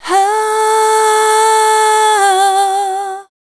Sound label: VOC F, Dance Vocals, FEMALE